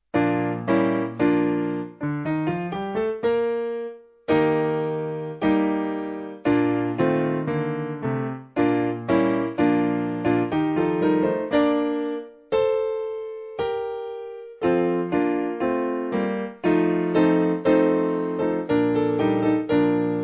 SPiano

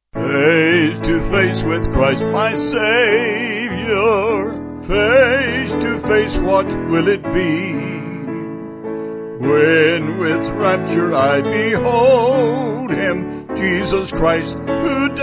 Vocals Piano

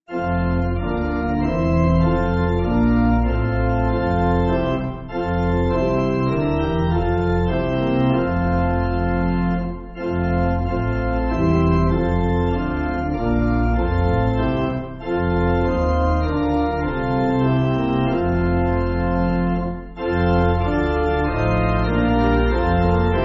How Holy Is This Place, Pipe, Pipe Organ

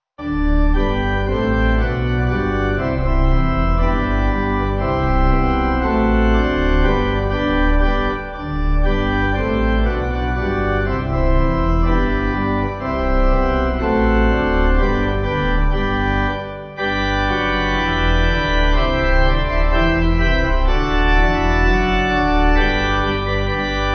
The Spirit Lives To Set, Pipe

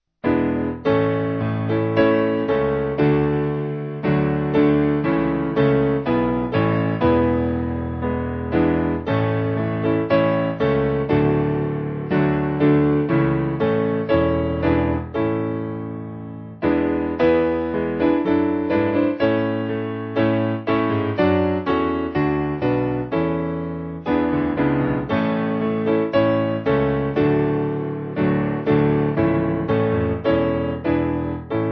Piano

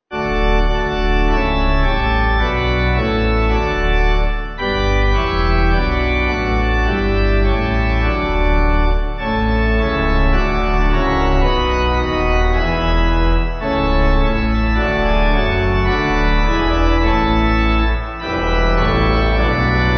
Pipe, Pipe Organ

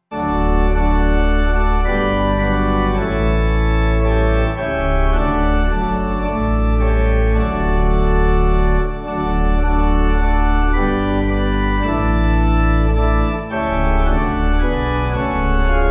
Pipe Organ; The Homeland

